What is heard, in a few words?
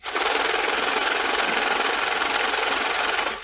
money; r crate